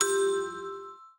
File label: ui card bounce